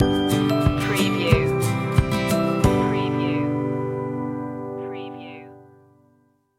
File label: whistle along stinger